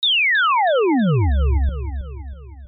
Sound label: Buzzer Sms